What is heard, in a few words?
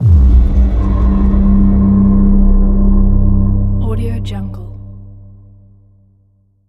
dramatic impact; anderfast